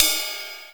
ride cymbal sound A key, ride sound clip tuned to the A note. Loudest frequency: 7393Hz; tuned cymbal samples